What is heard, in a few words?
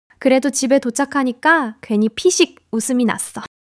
laughter